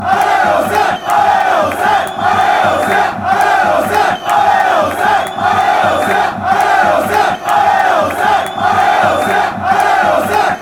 come on, chants; auxerre

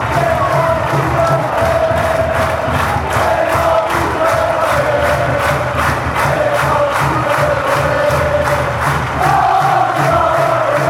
lever ole

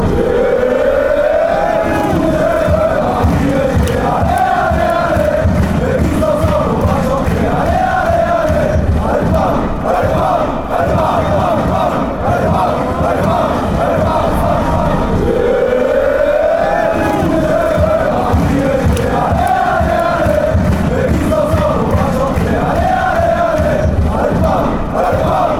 all together, fan chants

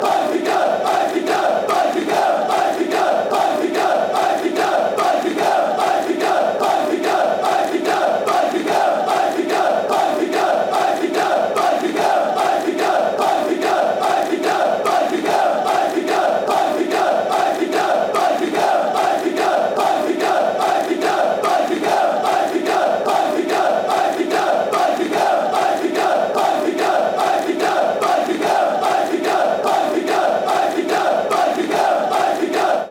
benfica benfica, fan chants

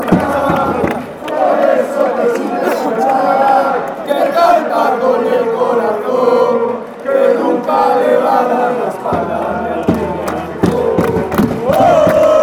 heart fanchants

